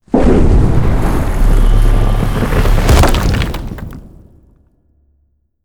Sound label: bonus Bomb; netent